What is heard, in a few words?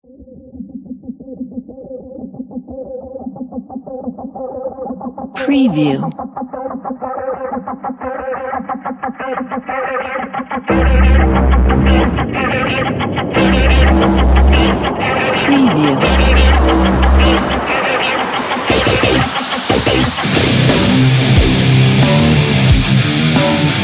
video game hard overload